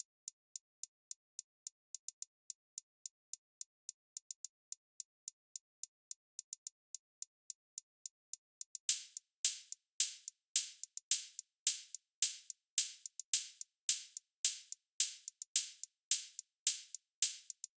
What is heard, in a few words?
loop hat 108; Seismic Loops